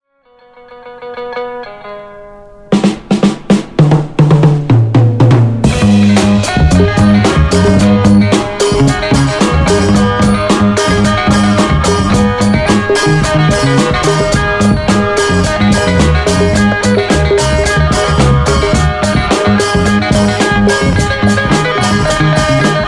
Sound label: luk thung jazz molam, thai